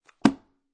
Foley, Book, Paperback, Closed From Middle Of Book; Click